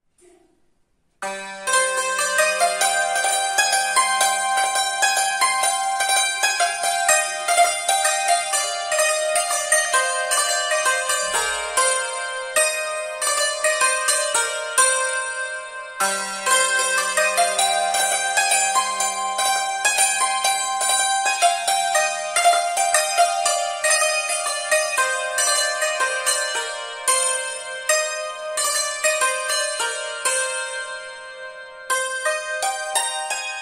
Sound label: Santur, سنتور